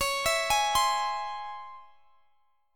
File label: Db Minor Major 7th Db; arpeggiated; x,x,23,21,21,20